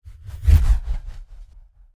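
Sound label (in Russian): поступательный эффект трения разрывной бумаги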